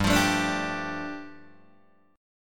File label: G 7th Suspended 2nd G, Guitar Open D